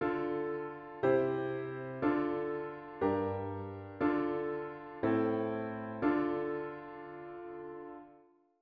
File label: modal prog